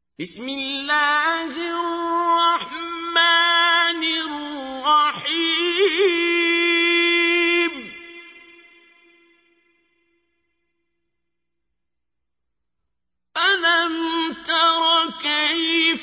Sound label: Recite, qari quran; Surah Feel; Qari Abdul Basit